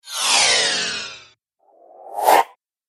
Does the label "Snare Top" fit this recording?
No